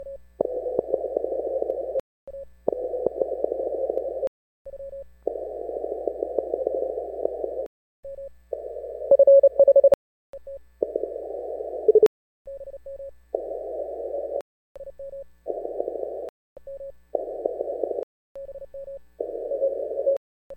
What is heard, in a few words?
Snipped Samplse from ARRL DX CW 10 and 15 Meters; Elecraft K4; Key - Unkey Pop